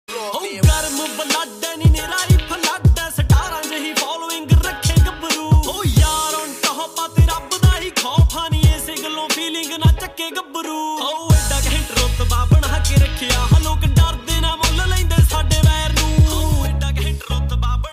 Dance Punjabi Ringtone

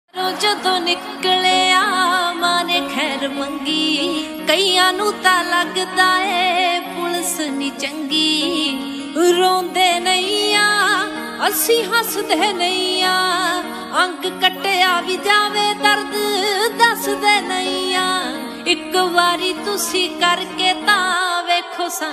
Popular Punjabi Ringtone

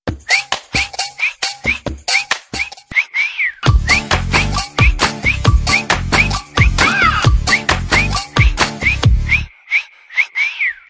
Whistle; Bheeshma Glimpse

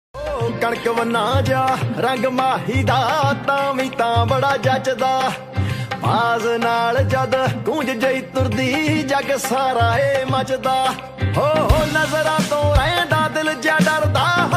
Punjabi Tune Ringtone

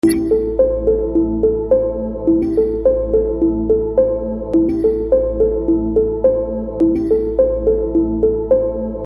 BG Tone Telugu Ringtone

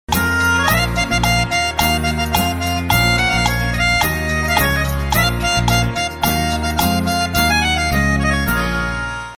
BG Tone Telugu Ringtone

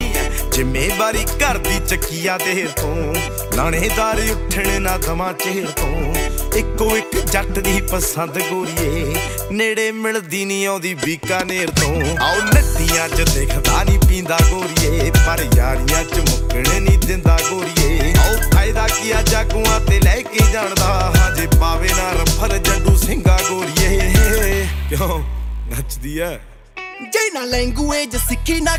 Punjabi Beats

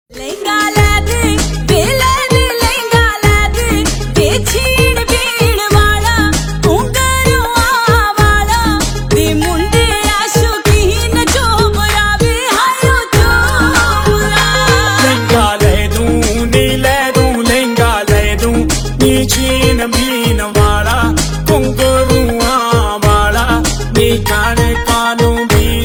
Desi Punjabi Ringtone

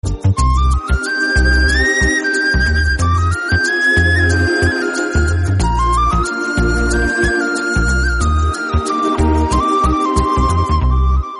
Flute BGM, Telugu

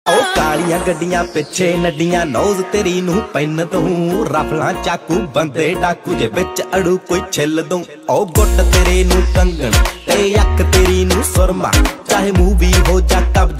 Punjabi Beats Ringtone

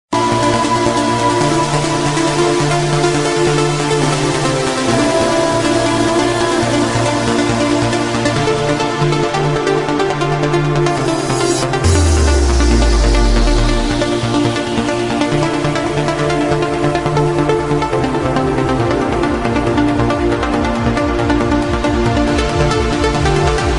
Cool Techno Ringtone

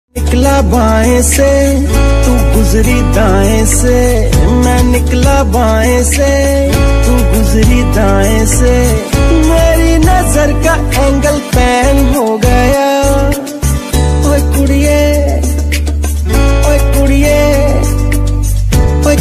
Punjabi Ringtone

